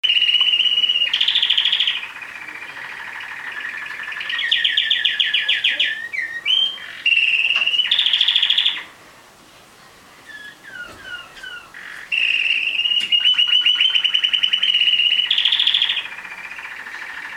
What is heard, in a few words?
Canary, Bird Songs